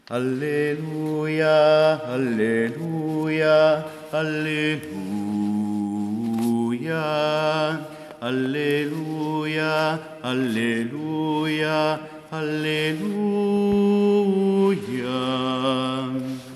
Alleluia BASSI; ARCIDIOCESI DI SANT’ANGELO DEI LOMBARDI-CONZA-NUSCO-BISACCIA

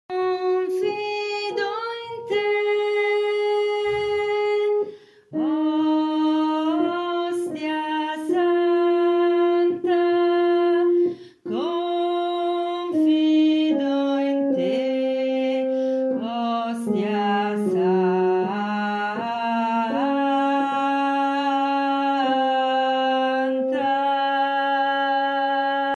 O Ostai Santa FINE CONTRALTI; ARCIDIOCESI DI SANT’ANGELO DEI LOMBARDI-CONZA-NUSCO-BISACCIA

